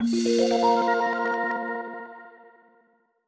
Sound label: Level Clear; Lucky and a Life Worth Living: A Jigsaw Puzzle Tale; windows